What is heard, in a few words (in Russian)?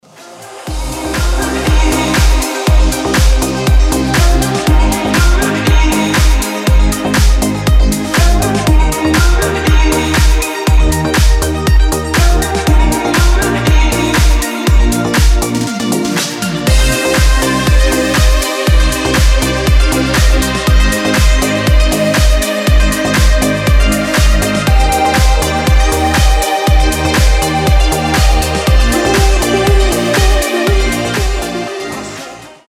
krasivoe disko; garne disko na vazhlivij dzvinok